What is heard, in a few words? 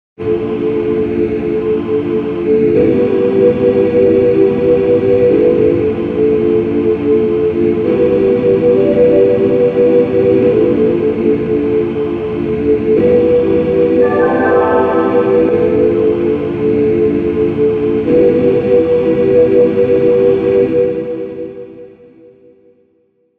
Never boring pad